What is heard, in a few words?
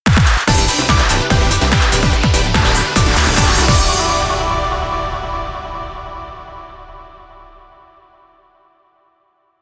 victory jingle; Rumble Roses XX